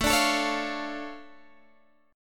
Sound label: D Minor Major 7th, A, guitar